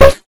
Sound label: hit